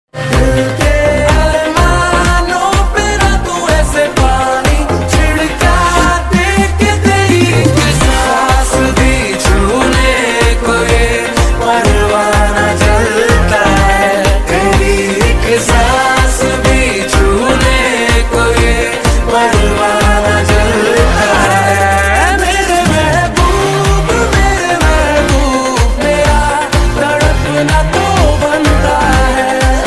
male hindi ringtone

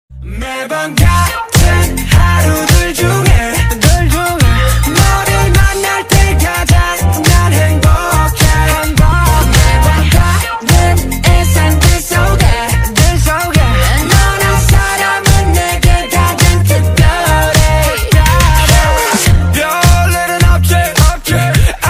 korean ringtone

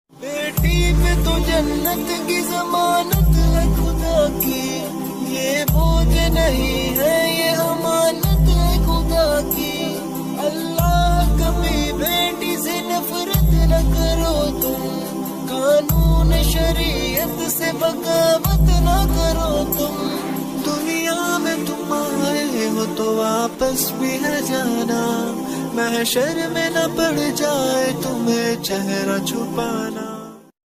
islamic ringtone

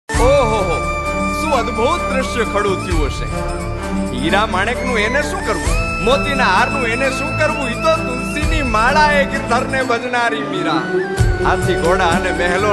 gujarati ringtone

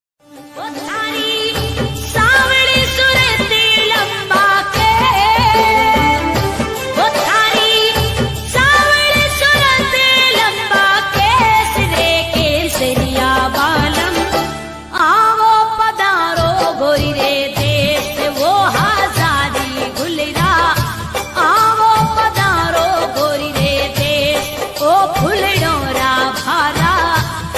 female ringtone

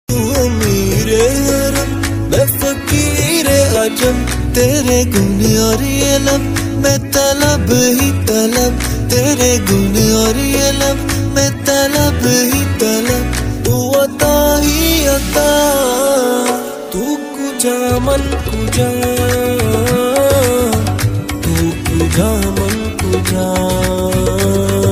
islamic ringtone

